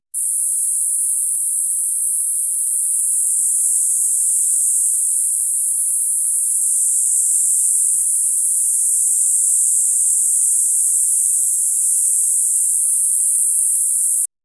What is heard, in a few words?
long menacing cobra hiss; reptile